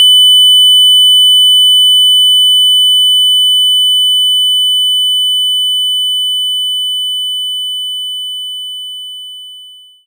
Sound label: Triangle 3000Hz; knights try